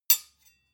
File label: sword hit clack soft